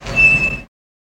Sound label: Car Brake Shrt Squeak